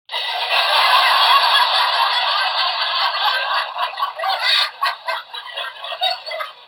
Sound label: laughter